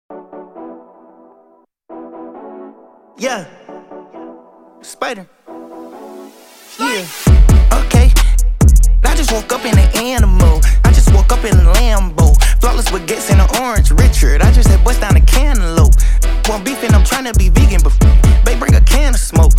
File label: (Clean)